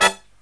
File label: HIGH HIT